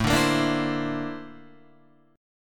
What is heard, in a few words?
Bb Major Sharp 11th A, chord